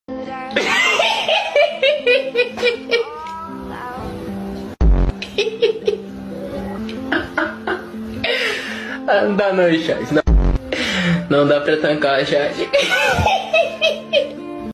risada fake

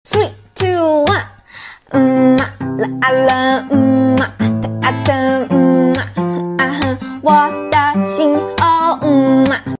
muler cantando

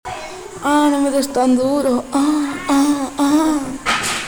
gemidos de humanos

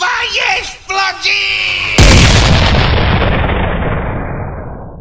vai explodir com explosao

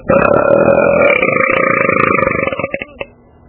arroto

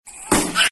grito

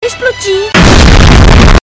explodir

